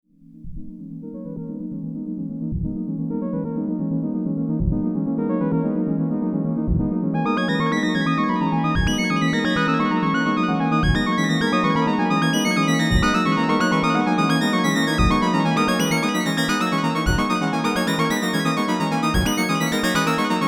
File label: Behringer Pro 800 Classic Sequence Reverb Delay